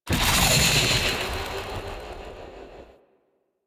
Fairy Sprint Thrust; DE; Warframe

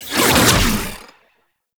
Whip Whoosh; Warframe; The New War; Archon; Nira

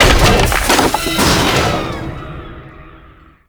Loot Crate Open; Tau; Tau Circles Of Hell; Loot Crates

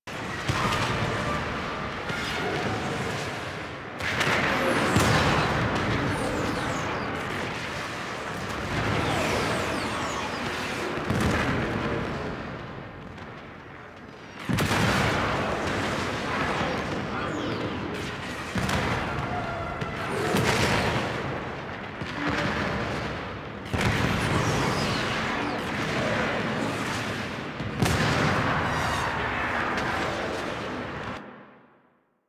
Sentient Devourer Exterior Battle Ambience; Warframe; The New War

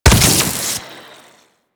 Adhesive Fire; Enemies; Abilities; Warframe; Corpus; Arachnoid Hunger

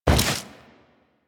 Generic Jump, Foley; Characters; Warframe

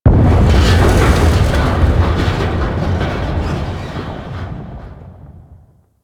Space Low Explosion Debris A; Warframe; Corpus Space

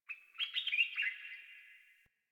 Birds Low, Ambience, Plains; One Offs; Warframe; Eidolon Plains; Eidolon Landscape

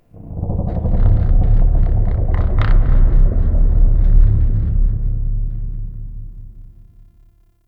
Cave Low Creaks, Ambience; One Offs; Neathuns Root Cave

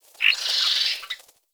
Fey Flock Attack Dive

